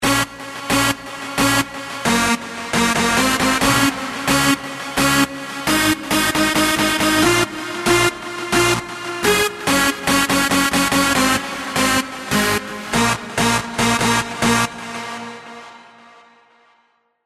COMP BOUNCE LD

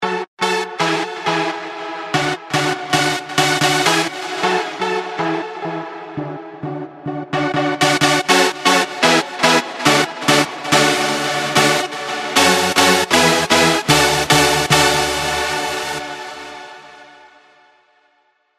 ARP

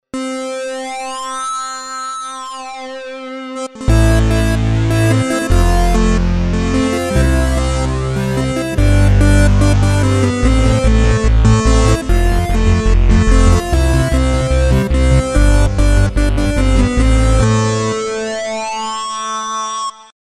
Lead

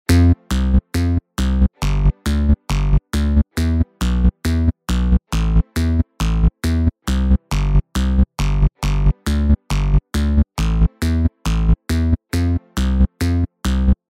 Bass 90

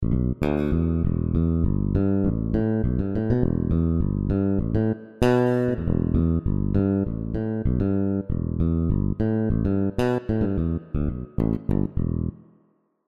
EZ BASS

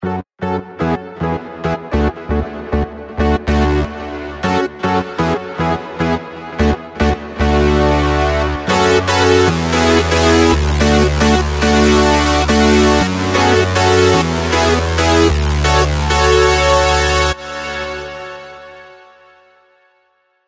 8K MID DETUNE

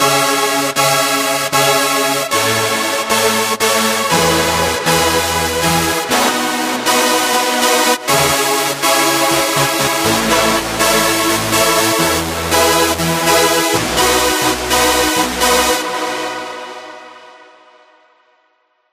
BIG SAW CHORDS

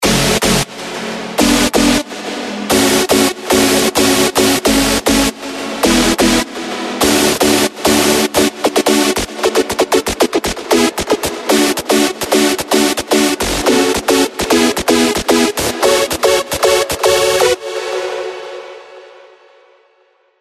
BIG SUPER SAW

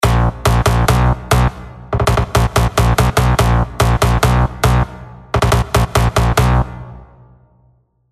COMP PUNCHY BASS

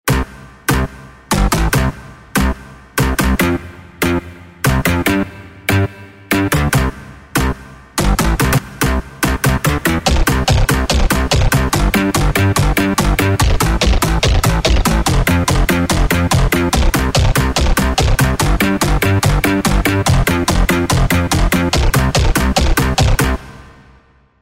Bass Rave

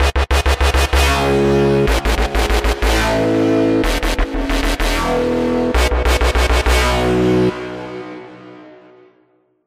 GROWL

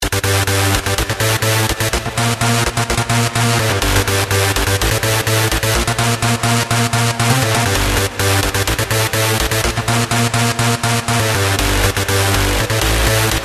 HARD DISTO

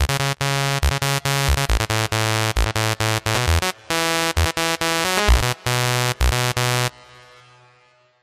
FUZZ

